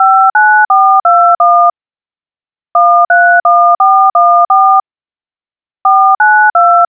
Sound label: dtmf